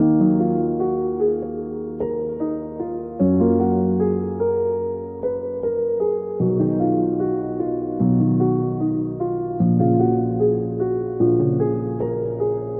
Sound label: piano, Loops, Sad